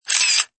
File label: camera